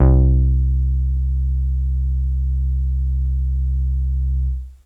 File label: Polysix Heavy Vinyl Synths C1, bass